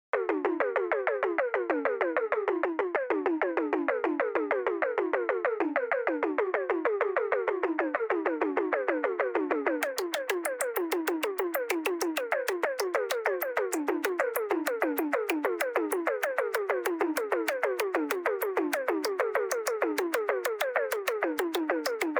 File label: odd percussion sequence